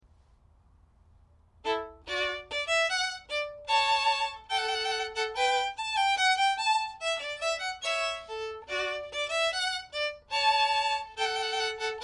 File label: Te Deum faster, violin; charptentier